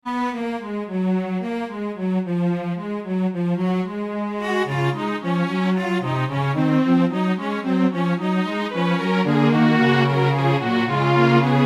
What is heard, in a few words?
fugue